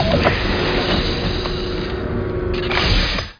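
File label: open door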